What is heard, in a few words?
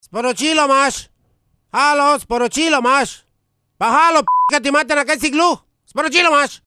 Sms; Zvonenja